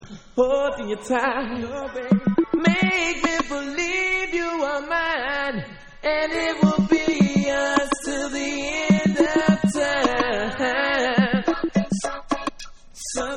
Acapella Beat

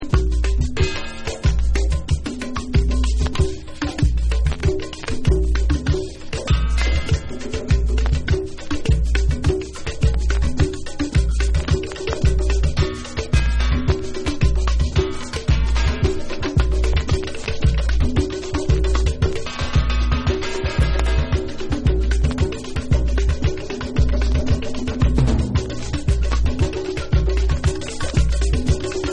BATERIA

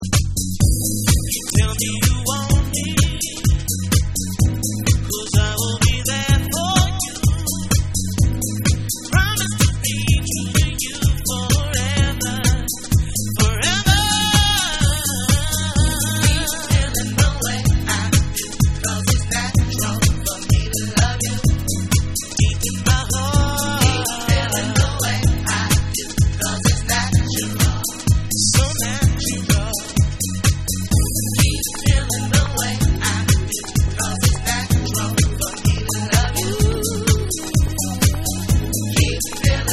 Original Vocal

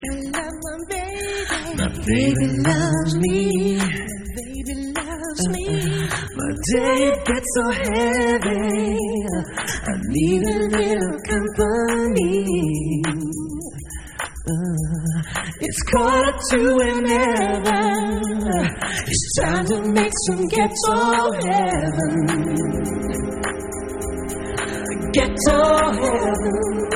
Acappella Version

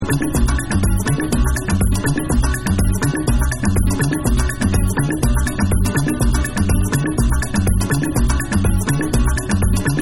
SAMBA

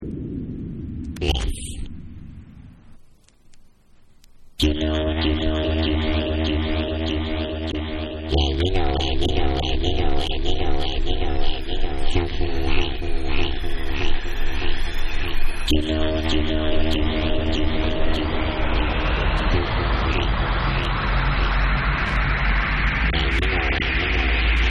E Accapella

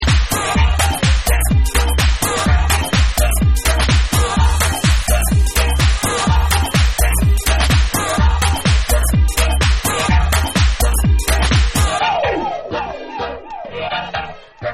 Dub

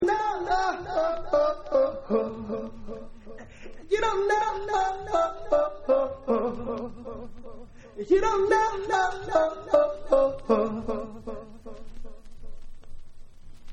Acapella Mix

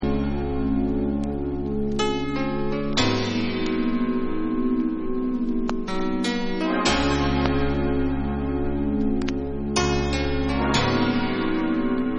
Acapella Reprise

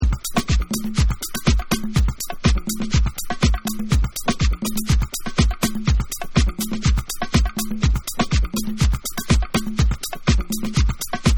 Bonus Beats

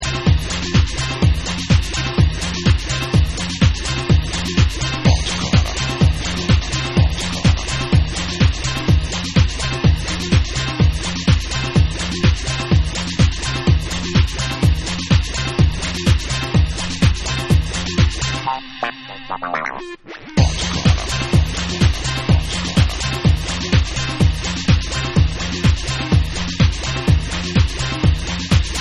BATUCADA Dub

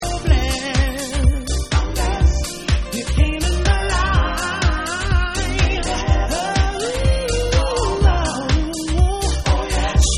Vocal Mix

